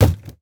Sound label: wood hit